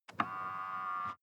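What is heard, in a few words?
sports car interior side mirror adjust short; verkehr transport; autos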